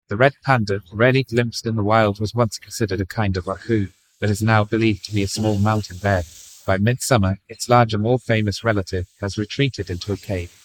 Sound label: Kampala street; AudioFocus